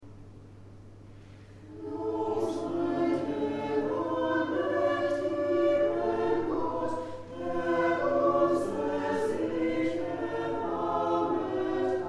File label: Lob sei Chor; Orgelbuchlein